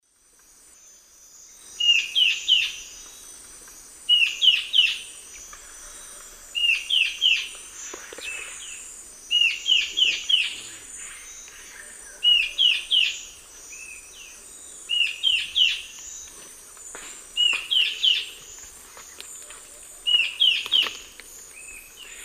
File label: Hypocnemis peruvianus, call; S. America; Eastern Lowlands